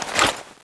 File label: catapult draw